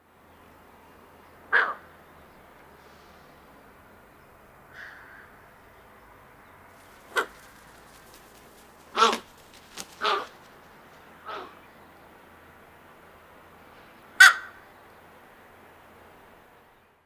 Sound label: Yellow crowned Night Heron Nyctanassa violacea